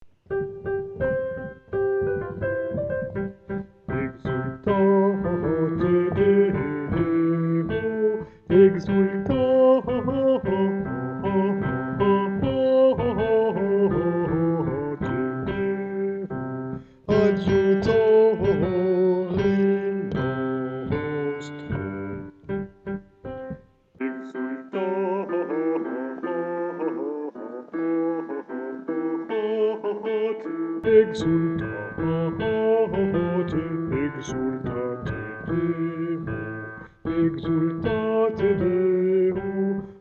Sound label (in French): basse exultate